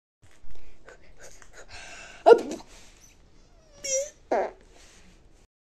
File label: farted